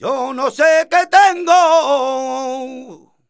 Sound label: voc c, Flamenco Vocals Male Cantaor